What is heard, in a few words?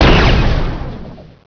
rail, ambience